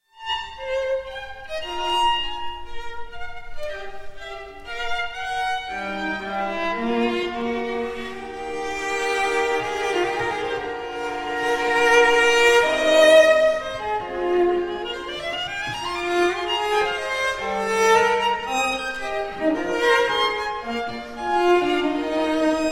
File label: string quartet in b flat major; Belcea Quartet; Wolfgang Amadeus Mozart